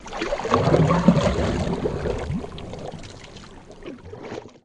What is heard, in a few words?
Canoe Paddle Stroke Underwater